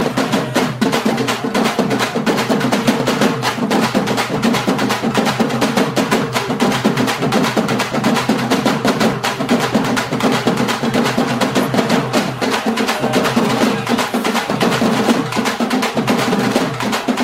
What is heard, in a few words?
Evil Folk Sounds